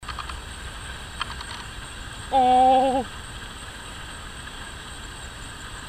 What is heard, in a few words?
river otter